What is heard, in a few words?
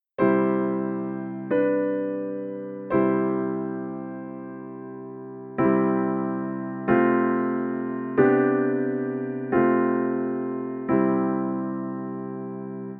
tonic pedal C; Guided practice